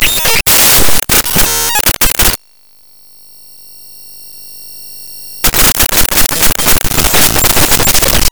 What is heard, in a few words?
talons piercing skull